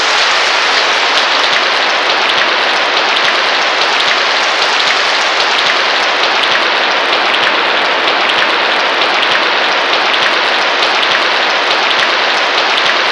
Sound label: rain